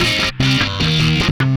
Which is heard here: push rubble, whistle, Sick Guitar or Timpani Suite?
Sick Guitar